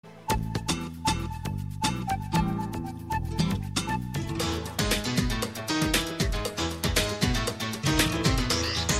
Nice Musical

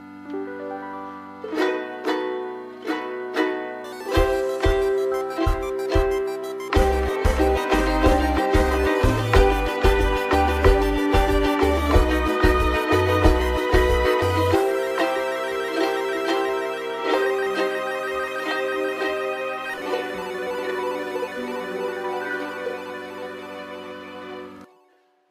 Theme Instrument

